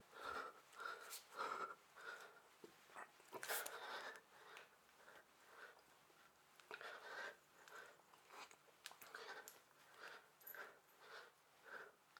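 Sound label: Exercise Push Ups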